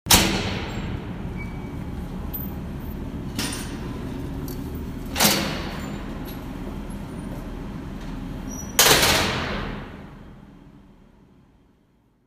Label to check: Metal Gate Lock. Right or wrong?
Right